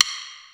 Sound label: Side, Yamaha SY22